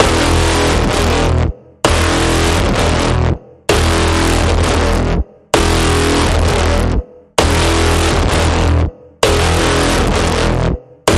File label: Pulsing Code Generator E